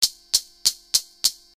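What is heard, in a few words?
closed hi-hat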